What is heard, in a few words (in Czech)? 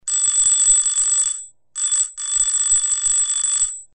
zvonek; elektro